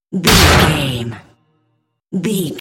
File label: cinematic stab hit trailer